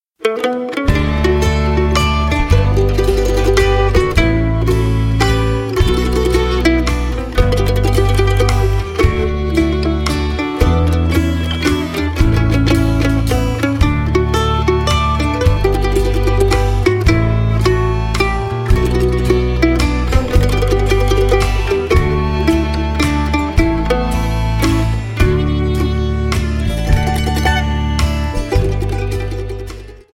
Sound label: Waltz; Cold Mountain